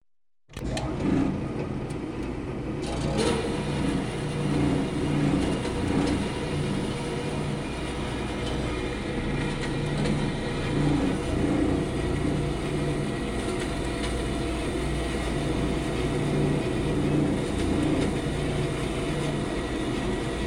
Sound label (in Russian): pila lentochnaya otpilivanie metallicheskoj zagotovki